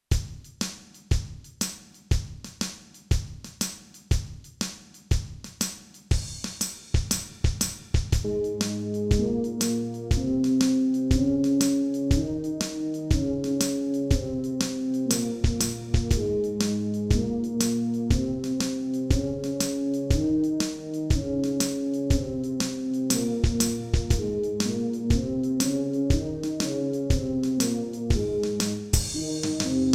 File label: Scale Bb, brass practice